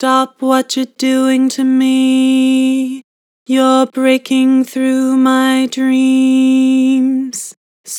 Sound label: Vibrato, Vocals; Stop what your doing to me; Harmor Vocals